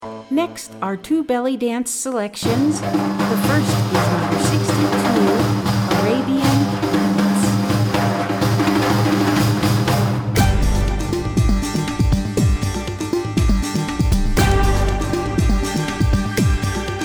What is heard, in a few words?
Arabian Beats